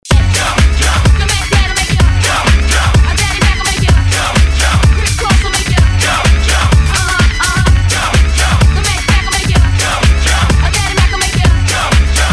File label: Club Party Banger